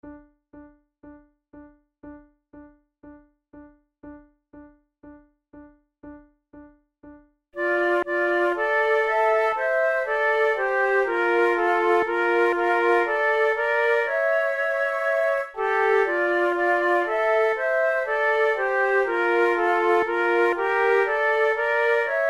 trio, flute tunes

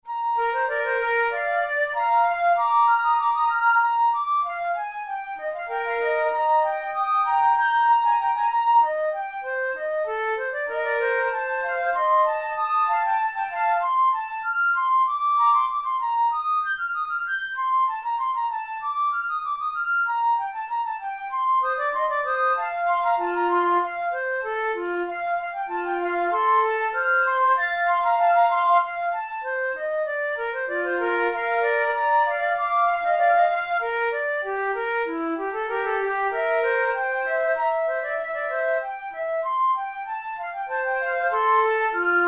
in b flat major, flute

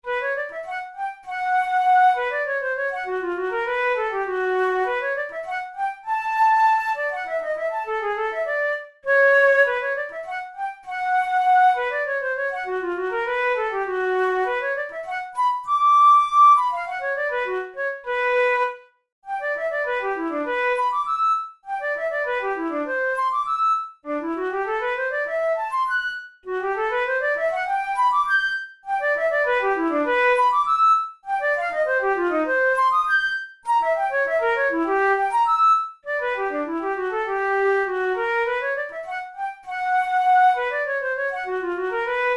study in b minor, flute tunes; kohler op93 no2 study no5

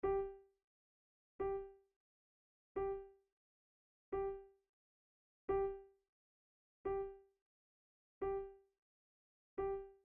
adagio, flute

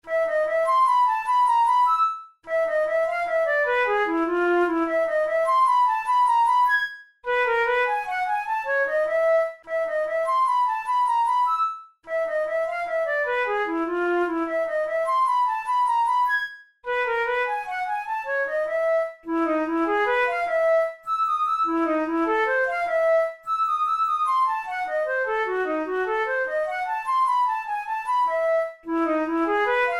study in a minor, flute tunes; kohler op33 no1 study no4

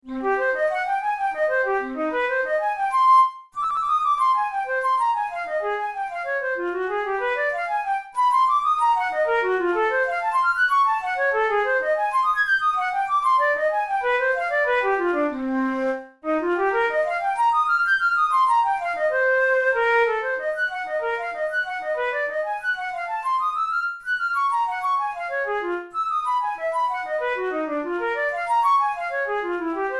studies for flute solo; karg-elert

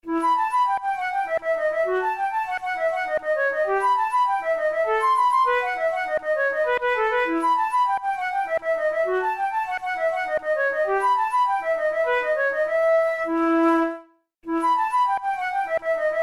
braun pieces sans basse no17 double, flute

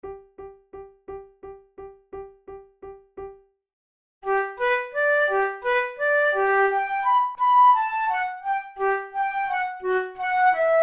waltz duet

